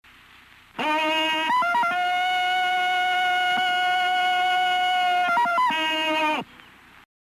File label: yell; tarzan